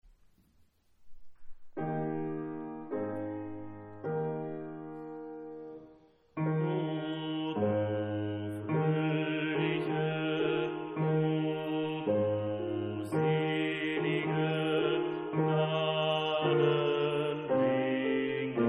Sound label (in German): Oh du fröhliche bass; Weihnachtsprojekt 2024